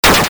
enemy Attack; arpg

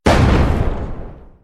asteroid Collision; AsteroidPatrol3D

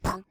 collide

